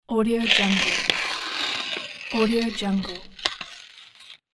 paper tearing

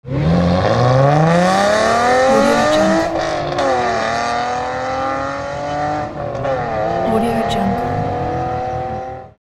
noisy car driving away

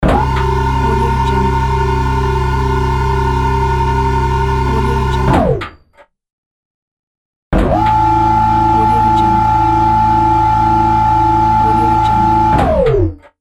robotic mechanism

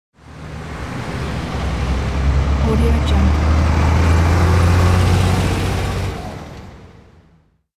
truck drives away

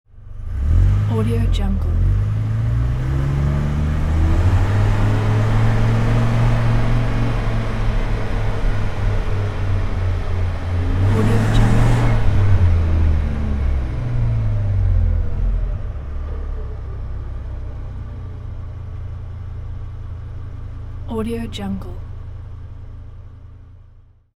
truck pickup sport v10 onboard fast driving; hollywoodedge

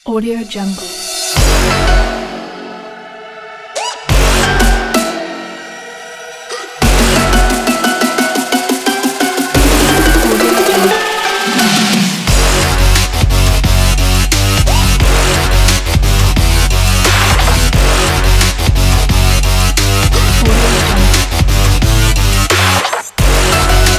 workout

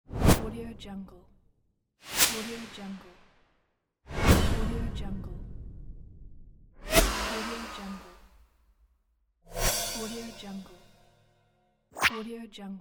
quick swish transitions; rouge-trader

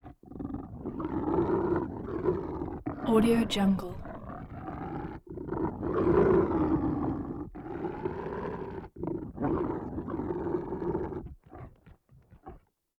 creature growls deep burbly breath grumble

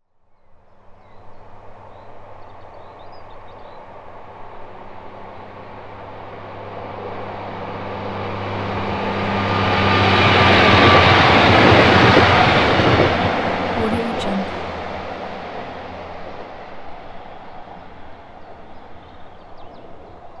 fast commuter train

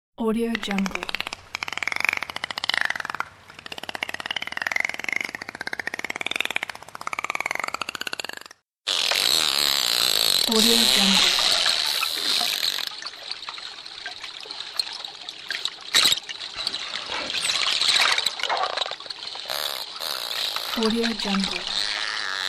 dolphin snaps and splashes in the water

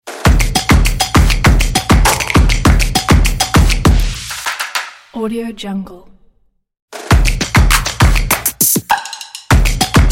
hybrid groovebox logo

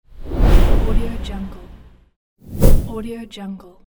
swoosh

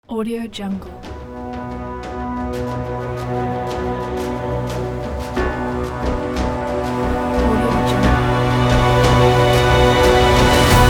epic start logo

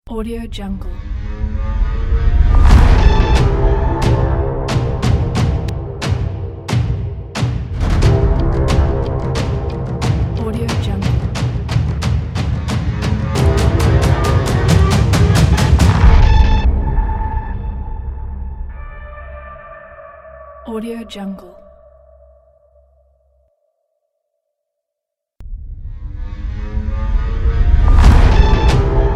thriller suspense percussive intro ident

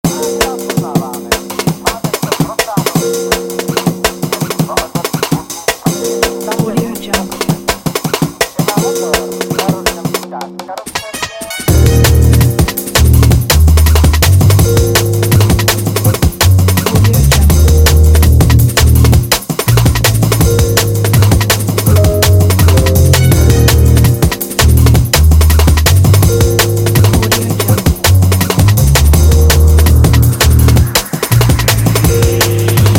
jazzy drum and bass

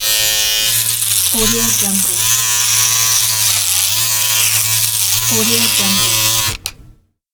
shaver

